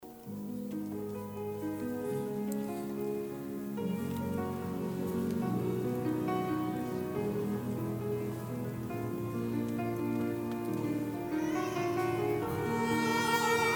choir Alleluia

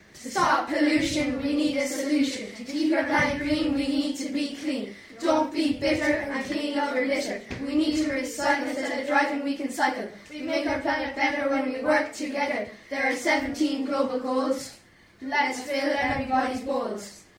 rap

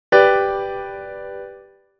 Piano

